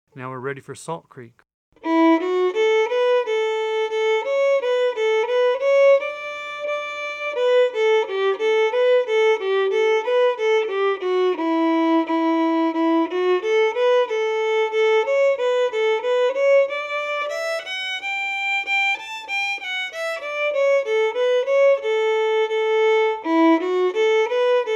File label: Salt Creek